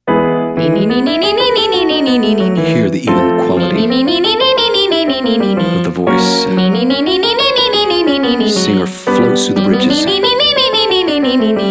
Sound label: Exercise Nee Female; Singing